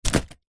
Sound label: household basket picnic hamper drop